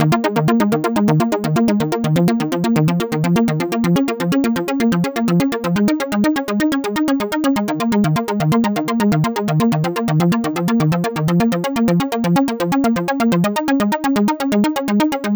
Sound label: arp to end all arps